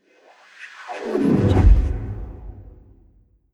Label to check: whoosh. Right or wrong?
Right